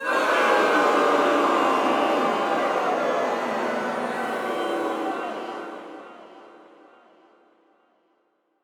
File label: Boo Crowd; Lunaro